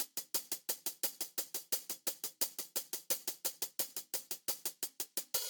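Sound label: Music Maker Hats; barbie dreamhouse adventures; Hats 4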